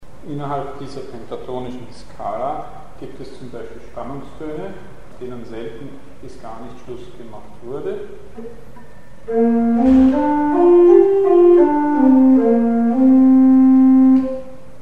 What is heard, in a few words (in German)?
Spannungston in Pentatonik; Mikrobuch